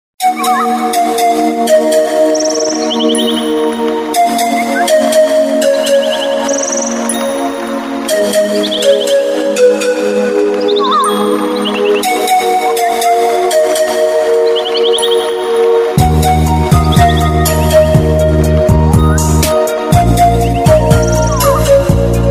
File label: relax ringtone